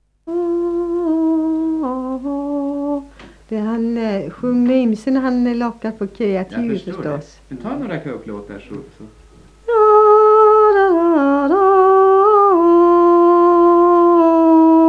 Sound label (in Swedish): Kauklåt; efter mormor